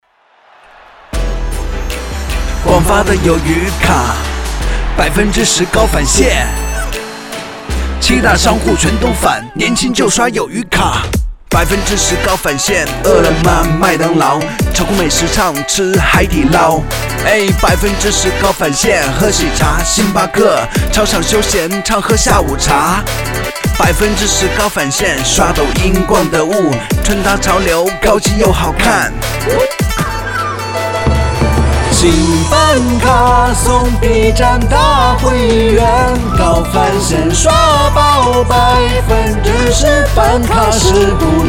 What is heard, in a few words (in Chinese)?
说唱; 广发